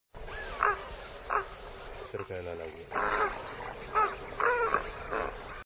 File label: Snowy egret